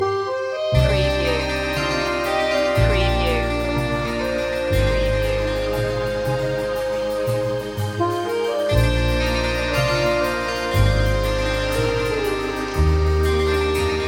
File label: mist accordeon loop